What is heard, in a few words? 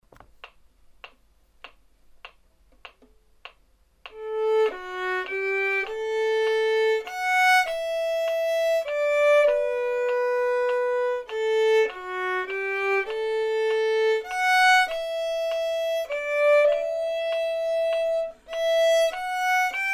vln prac